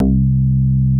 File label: CLICBAS